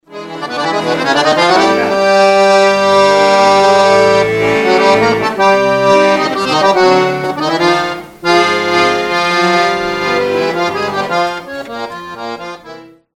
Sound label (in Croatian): harmonika